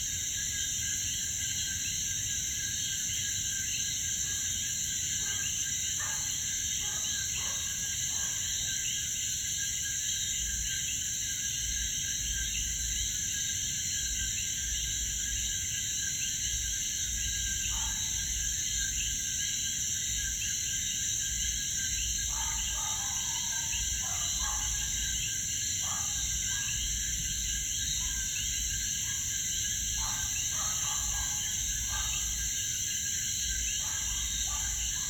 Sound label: frogs, puerto rico; Pignones; Cokees